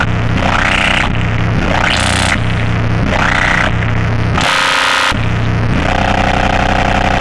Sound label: Rumble, Techno; Hard & Loud Techno; USB Soundscan vol.01; AKAI; 90s sample CD